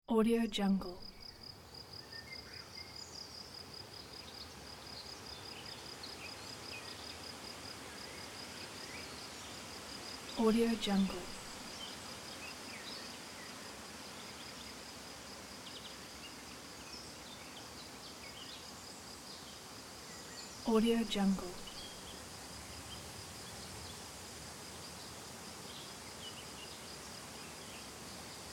windy forest with crickets and birds; osynthw

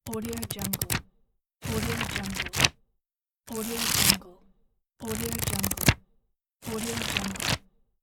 book pages flipping

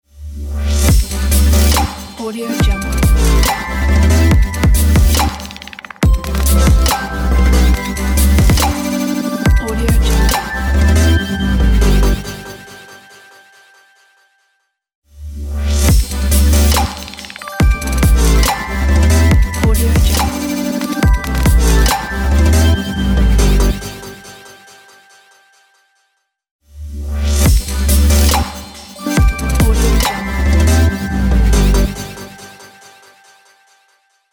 future bass logo

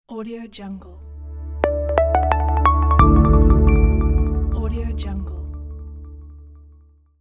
minimal happy logo

